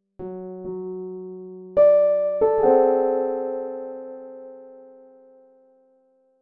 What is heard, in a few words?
Piano